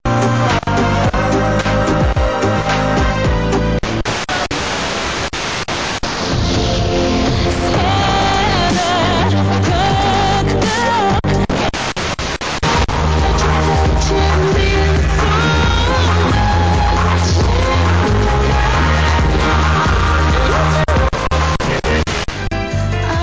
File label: 85 110 MHz